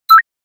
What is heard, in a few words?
button